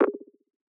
percussion oneshot droplet; Pop; Drums; SD PASADENA Pop Sample Pack; Slate

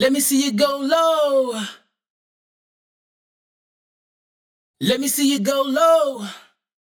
hook Go Low 99 F#m wet, Vocal; Slate Digital Omnivox Vocal Sample Pack

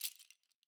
rattles Single Hit Clams; Slate; SD SEISMIC Drum Sample Pack

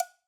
cowbell Single Hit Tip; Raw Perc One Shots; Slate; SD SEISMIC Drum Sample Pack

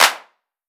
Clap, 909 Claps; Slate; Vintage Drum Machines Volume 3 909 Edition

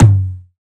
tom, Drum One Shots; Slate; SD MIDNIGHT Sample Pack; Toms

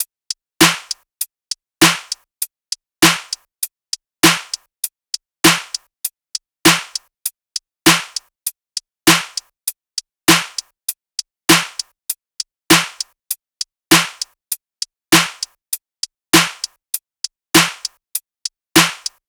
tops 100, Drum Sample Pack Loops Top Loops; SD SEISMIC; Slate

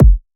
kick oneshot, Pop; Drums; SD PASADENA Pop Sample Pack; Slate

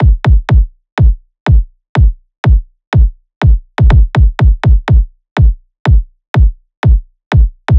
kick 123, Kick Loops; drum sample pack; Fogo; SEISMIC; Slate

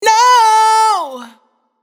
riff no Em wet, Vocal; Slate Digital Omnivox Vocal Sample Pack

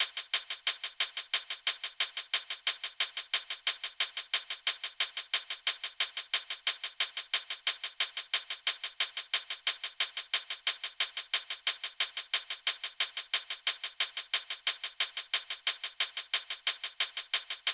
hat 90, Drum Loops, Hat Loops; SD MIDNIGHT Sample Pack; Slate

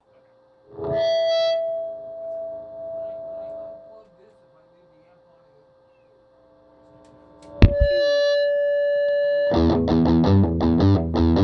Guitars Mix

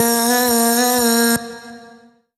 phrase Ebm, Vocal; Slate Digital Omnivox Vocal Sample Pack

